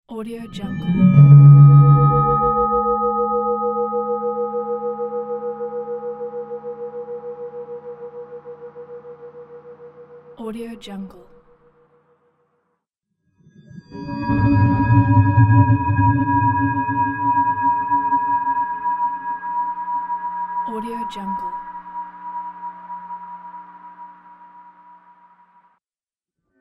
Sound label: tibetan singing bowls